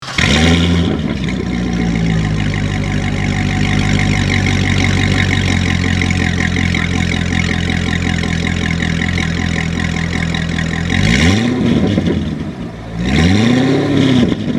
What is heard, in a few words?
Corvette C6